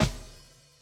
Kick In

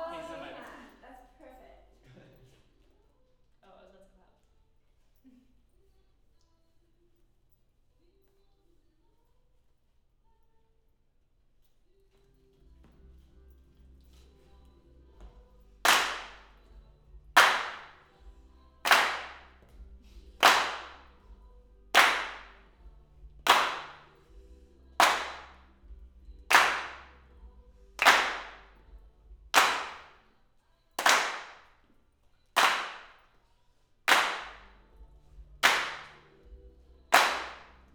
Claps Mid

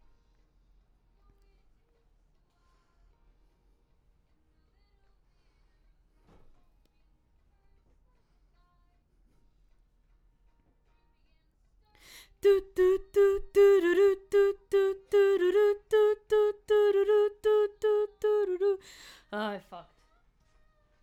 BG Vox

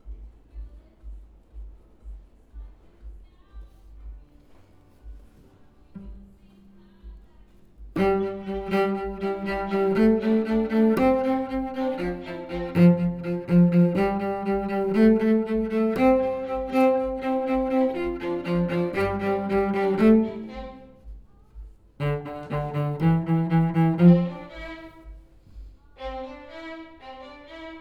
Cello

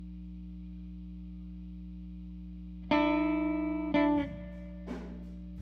GTR

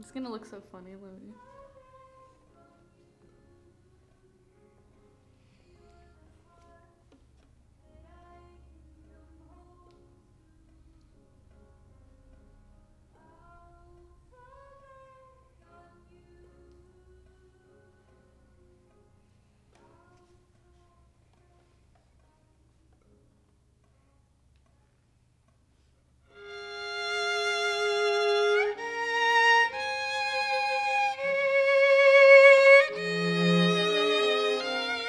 violin

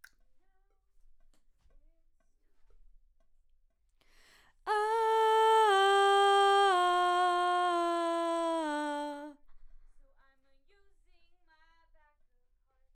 BG Vox

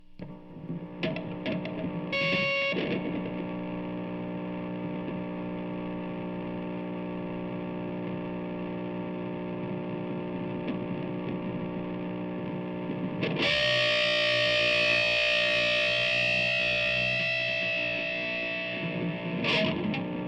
GTR

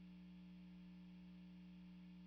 GTR; GTR RE20

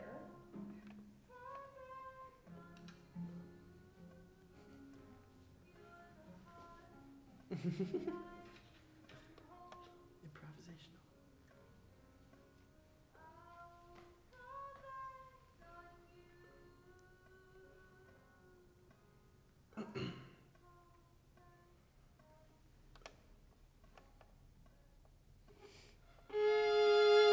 violin

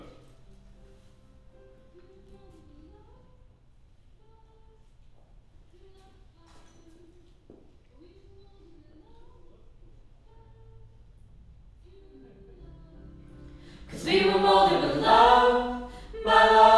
Choir L

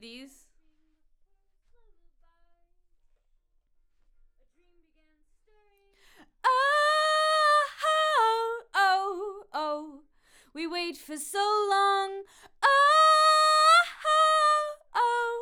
Vox start new

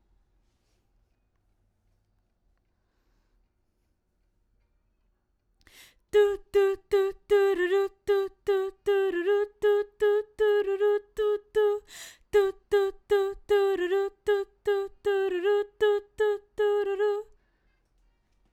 BG Vox

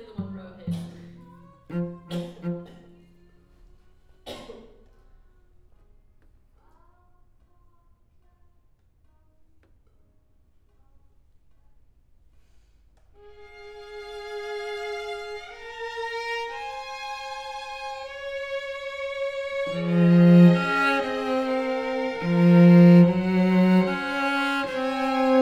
Cello

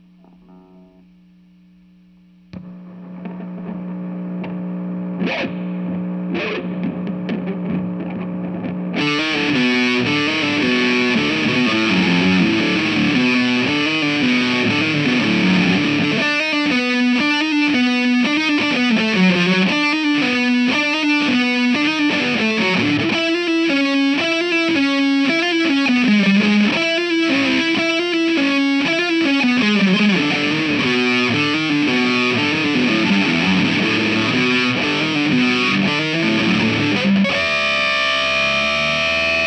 GTR

